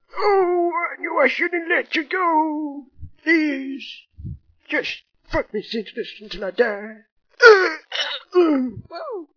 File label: die; sven; npcs; dr douchebag